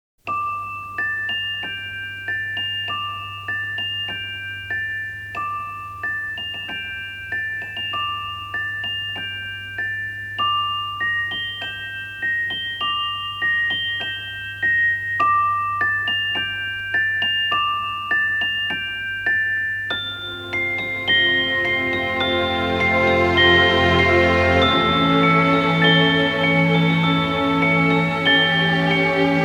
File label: Carillon